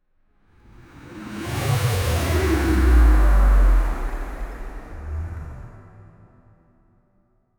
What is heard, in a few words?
video transition sound